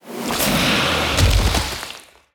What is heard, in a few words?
Death; Rocko